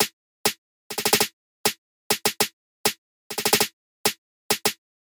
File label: snare